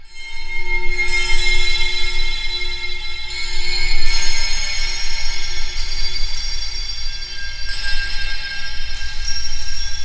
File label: environment fireflies